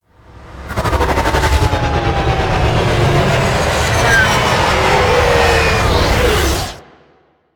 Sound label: Crp Ship Fighter Thruster Charge Land A; Ambience; Props; Warframe; Corpus Ship Remaster